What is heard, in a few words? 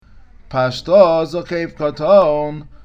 poshtah zokaf koton, cantilation